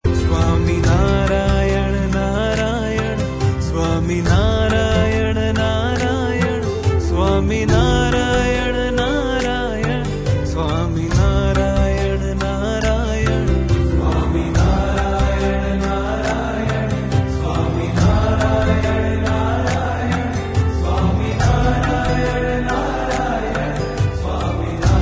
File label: Dhun; Swaminarayan